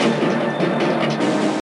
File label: Desert Percussion